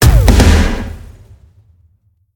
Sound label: Fire; smite; raijin